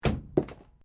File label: Metal Light Movement Minor